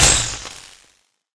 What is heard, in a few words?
electricity; smite; chaac